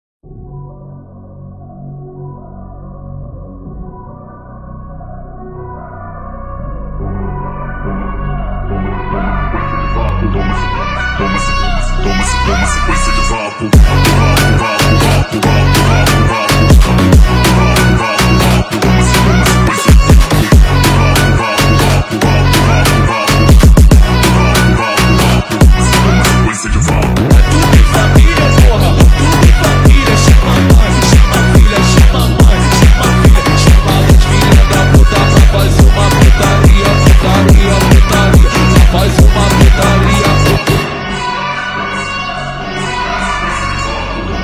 speed up Phonk

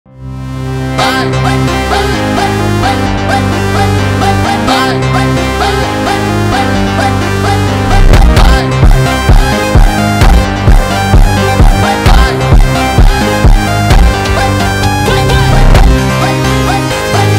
Brazilian phonk

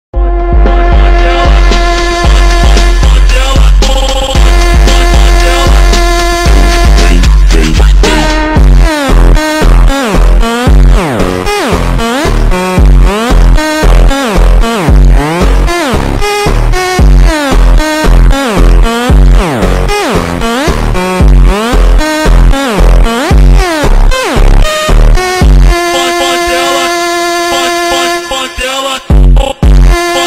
Automotivo slowed Brazilian Phonk

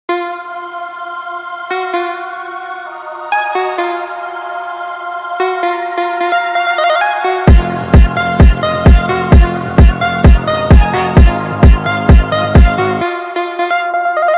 phonk

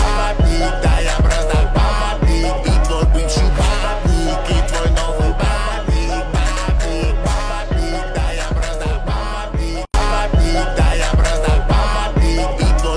Slowed reverb

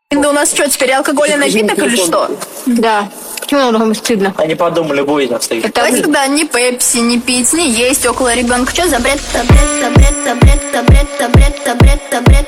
Meme Phonk

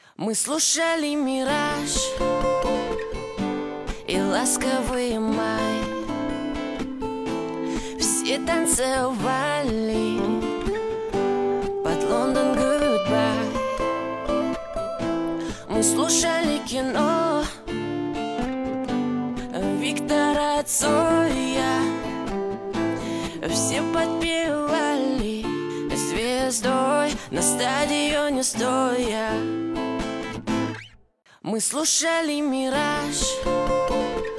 Acoustic version

